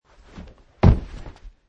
Landing; Man wearing jeans and hiking shoes jumping and landing on carpet surface - variation 15; Wrestling and Struggling; Michael Huang

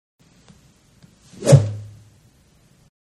Golf Practice swing with driver, No Ball; Golf (Sound Effects:Sports); Manhattan Production Music

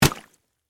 Splash Wet Mud, Texture, Splash, Wet, Mud; Splashes; Objects; BlastWave

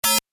Tech beep, Beep, High Tech; Tech Sound Effects; Tech (Sound Effects:Science Fiction); Stuart Duffield

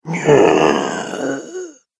Zombie, Zombie Sound Effects; horror; Grizzly Chops

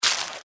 Squish Crunch, Bug; Impact; Squish Sound Effects; Squishes (Sound Effects:Objects); BlastWave

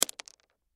Rock Drop Pebble Single; Small; Foley; Drops; Objects; Blastwave

